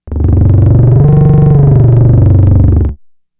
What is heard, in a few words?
FX, Drum Machines; Fricke Schlagzwerg